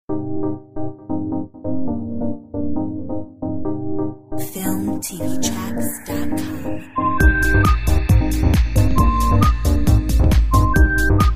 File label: Modern techno whistle; whistling and happy music track